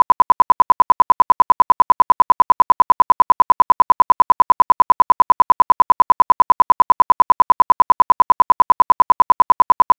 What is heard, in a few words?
burst; noise